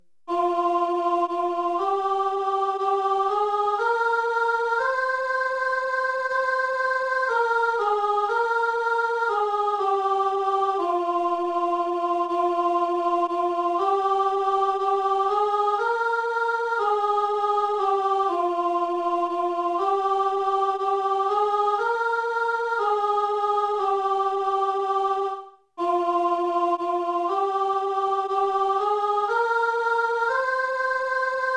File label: soprano; Pues que ya nunca nos veis